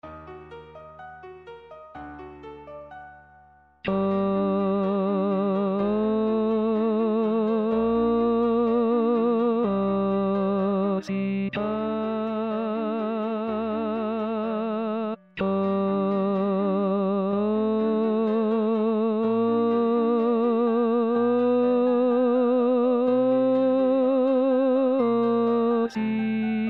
tenor; Corsica

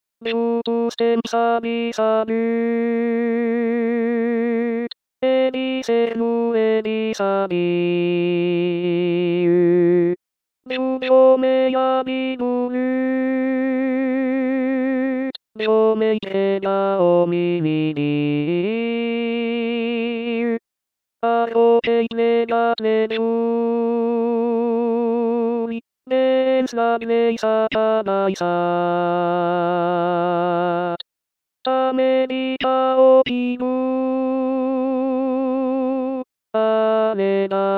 refrain alto; L'Encantada

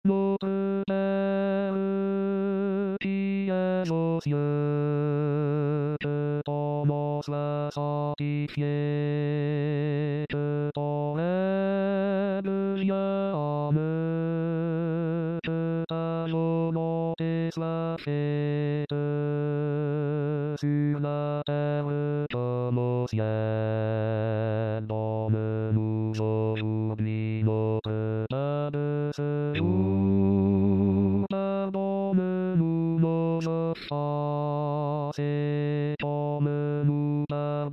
notre pere basse; R. Korsakov

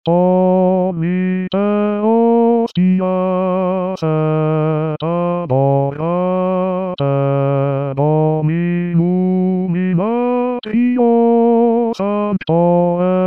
tollite hostias basse

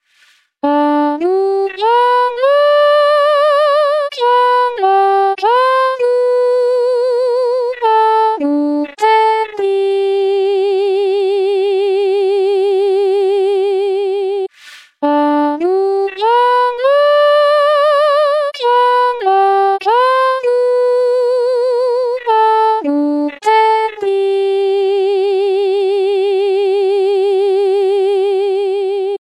soprano; agur jaunak

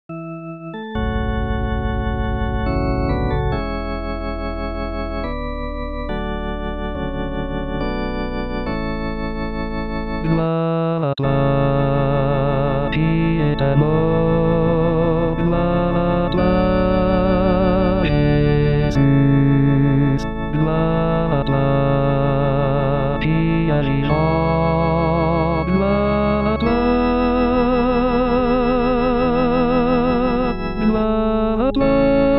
gloire a toi anamnese basse

